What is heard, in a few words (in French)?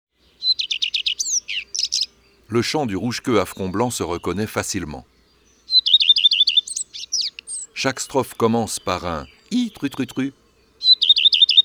Rougequeue a front blanc, oiseaux des jardins